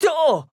AHurt; Fern

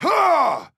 attack large

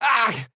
AHurt

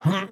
Movement Jump; Batman

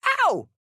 AHurt; Fern

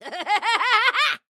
Charge; Harley

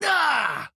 AHurt

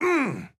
Attack Medium

